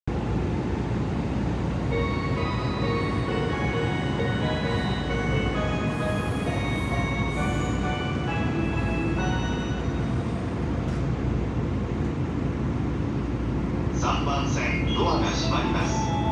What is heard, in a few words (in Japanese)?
JR常磐線松戸南